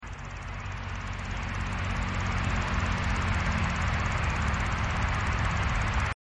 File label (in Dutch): Wekkertje Omocestus viridulus Geluid; Annelies van Klinken